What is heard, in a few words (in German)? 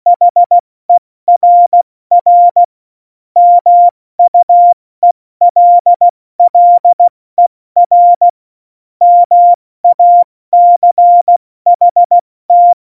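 Witz, cw morsen; Morsekurs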